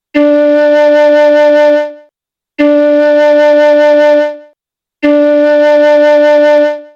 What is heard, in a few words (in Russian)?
1 oktava 2 re flejta